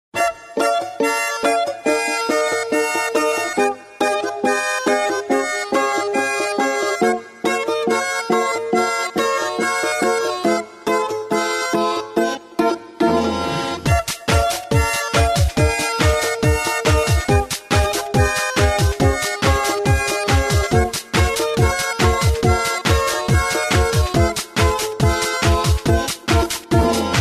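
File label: Balalayka, balalaika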